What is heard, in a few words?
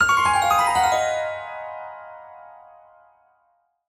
Mystery Arp; soundscrate